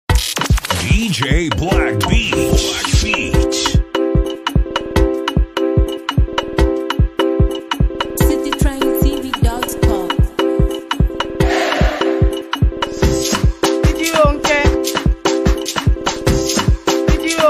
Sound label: Mara Beat